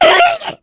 pain short, voice; video game; source engine; left 4 dead 2; jockey

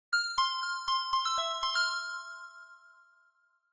aperture logo bells; Portal 2; Source Engine; video games

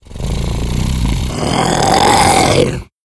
hunter stalk, idle; player; source engine; left 4 dead 2

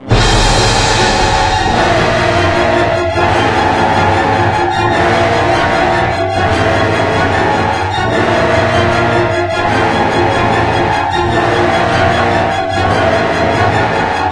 mortification, music; Left 4 Dead 2; Source Engine; video games

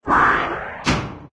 robotz recycle, windows sound schemes; windows 9x

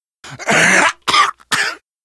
cough, survivor voice

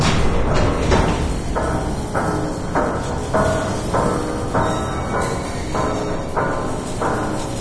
skylift move; Source Engine

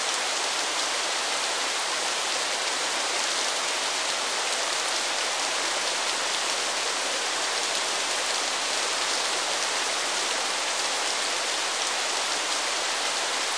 waterfall; de forest; Source engine

